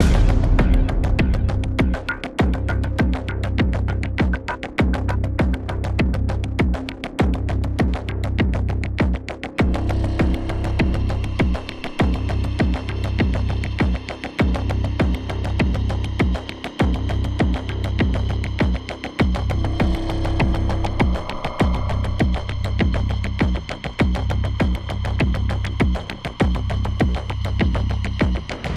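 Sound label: music, bass synth loop; transformers war for cybertron gamerip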